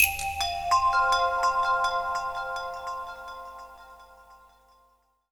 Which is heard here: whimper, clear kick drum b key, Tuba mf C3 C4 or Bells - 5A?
Bells - 5A